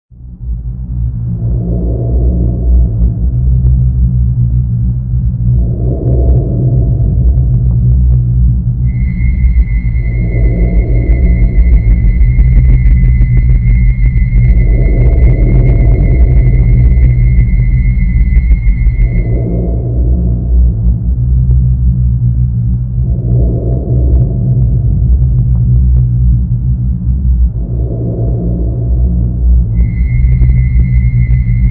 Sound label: Rumbling Whistle